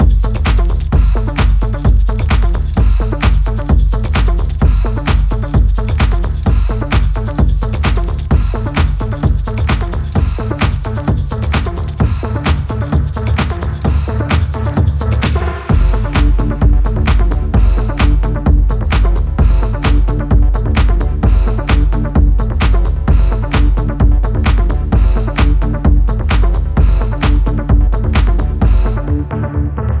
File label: Roling The Bass